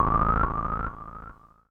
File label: KEY SHOT TEKNO 140 A; Techno2; Singleshots